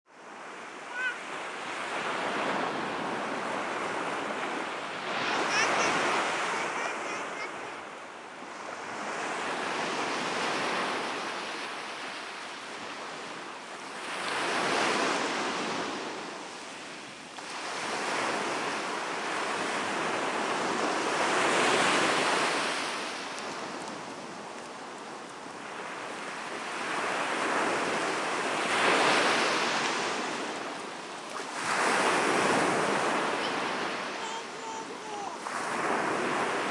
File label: waves crashing onto the shore; Sea & Waves